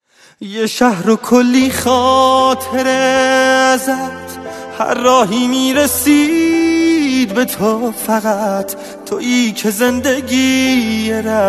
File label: Piano